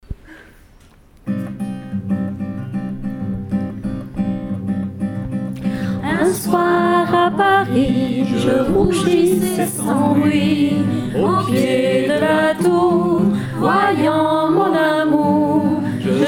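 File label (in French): Création chorale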